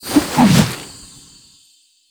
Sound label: Glyph Ball Throw; Warframe; Duviri; Glyph Puzzle